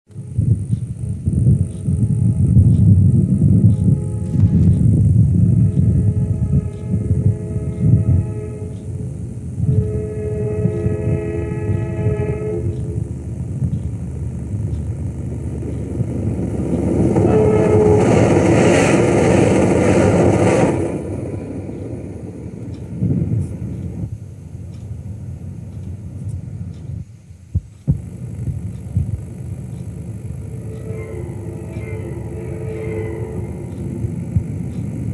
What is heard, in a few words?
Metroliner Bush River Bridge